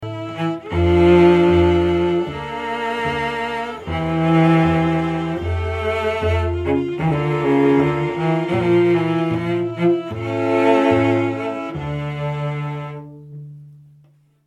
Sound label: tune ending